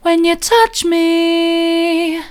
when you touch me soft, vocal

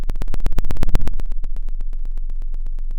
Sound of a fire crackling

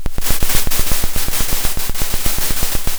Sound of a drum roll

